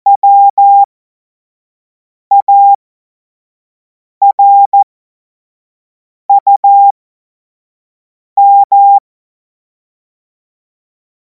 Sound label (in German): Witz, cw morsen; Morsekurs